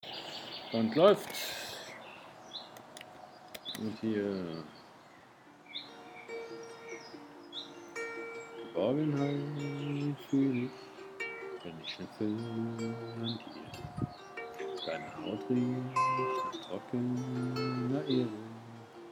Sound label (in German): wiegenlied historisch